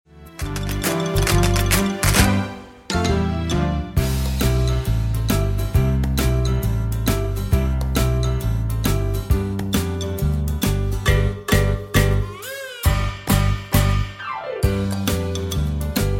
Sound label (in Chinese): Karaoke, 正向心理兒歌